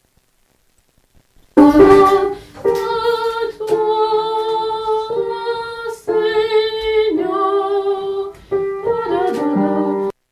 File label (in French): A Toi Seigneur Soprano